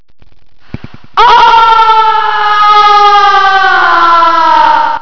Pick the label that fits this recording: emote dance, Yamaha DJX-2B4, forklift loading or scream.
scream